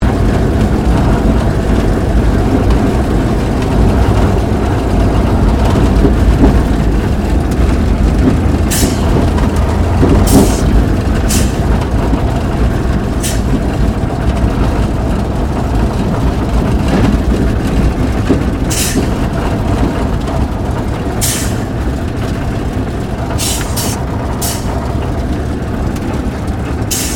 AIRCRAFT SUPERMARINE SPITFIRE MK-XVI TAXI INTERIOR STEREO; Airplanes; Sound Effects:Vehicles